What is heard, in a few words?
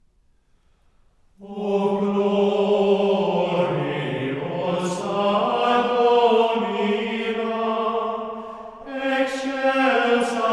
O gloriosa Domina, hymn in mode 2; The Chants of Mary